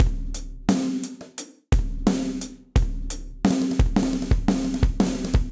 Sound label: Rolling Fill Multirod Dry 97bpm, drums; Fort Knox; Ableton; Factory Packs; Session Drums Studio